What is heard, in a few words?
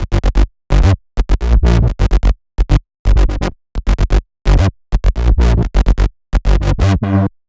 Uno Bass Root G, 128BPM; Vengeance Samples; Uno Kit